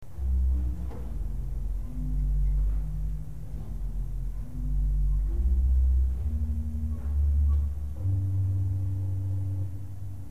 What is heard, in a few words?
subbas